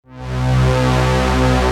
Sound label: DD SYN, Disco House; Best Service Dance Mega Disco House